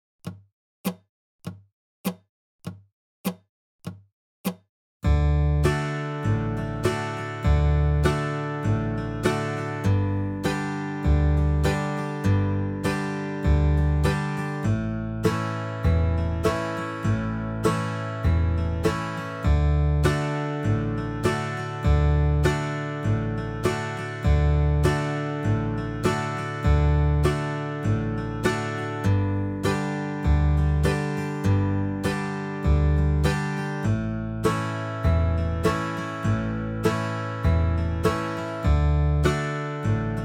Key of D backing track - 50 BPM